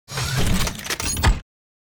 Sci-fi weapon reload rifle reloading

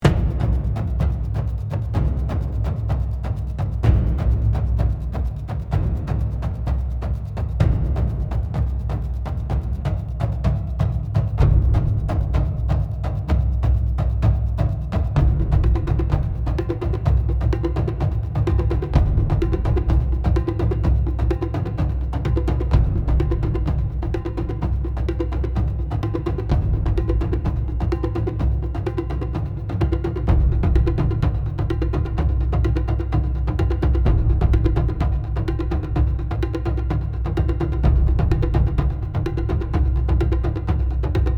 Tribal beat ritual energy

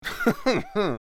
Joyful man laughing

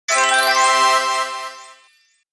Casino slot machine bonus win

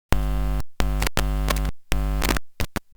Electric guitar jack noise

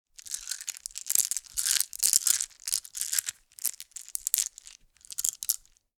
Game tokens moving clattering in hands

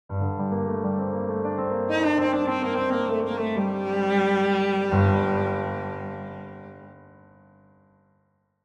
Game over you lose action arcade

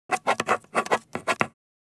Ballpoint pen writing on paper

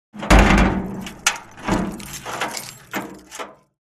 Prison door heavy metal jail door locking

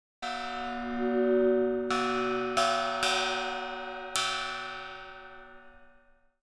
Gong hit and ringing out multiple times

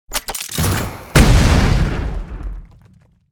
Anti personnel mine detonating explosion

